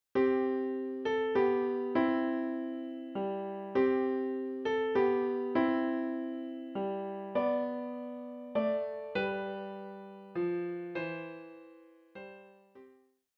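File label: piano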